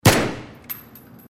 m9 inside

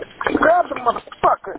dropped phone

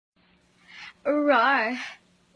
rawr

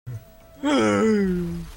eeeugh

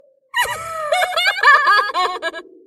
sub laugh

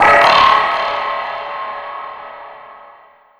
dispatch alarm

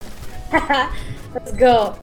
hehe

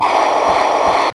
blam jumpscare sound; one week at flumptys

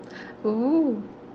uUUuu

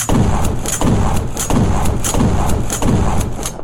shotgun

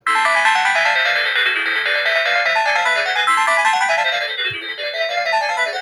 newspaper jingle

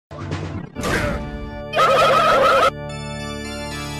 big slap

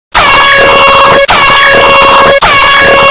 airhorn

